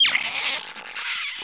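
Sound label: death, birds; Giants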